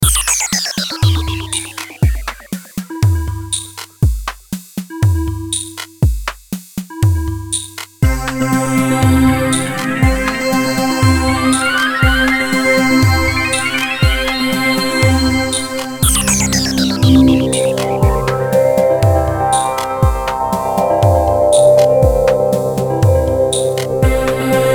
Beat Synth Drums Sound Fx Delay Organ, Beat Slow Eerie Industrial; Miscellaneous Loops